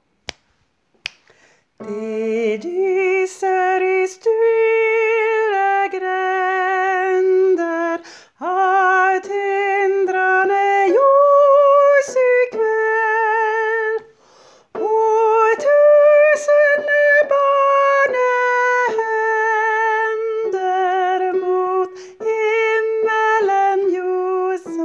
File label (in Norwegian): Sopran Det lyser i stille Julekv